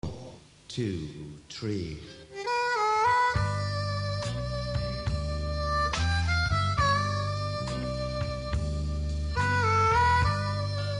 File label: southside blues, harmonica